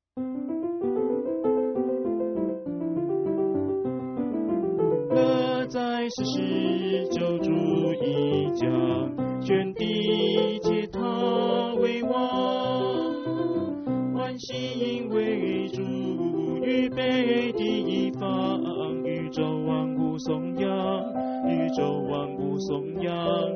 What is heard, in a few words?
bass